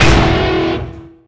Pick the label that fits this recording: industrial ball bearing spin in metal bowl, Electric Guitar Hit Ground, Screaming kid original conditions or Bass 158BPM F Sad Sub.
Electric Guitar Hit Ground